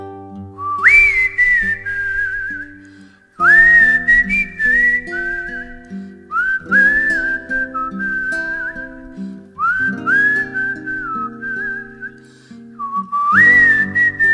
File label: ringtone; Iguanaville Music